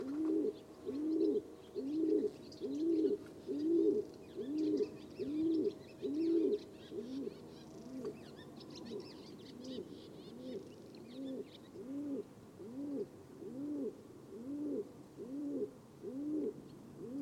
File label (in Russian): sizyy golub columba livia